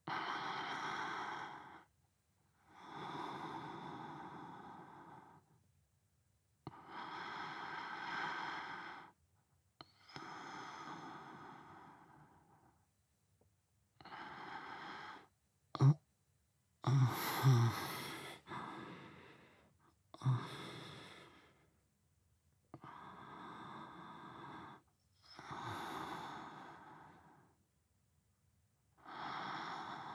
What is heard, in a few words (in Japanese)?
寝息ボイス; 犬系男子ハルくん